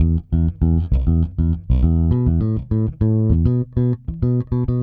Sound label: Pop, Bass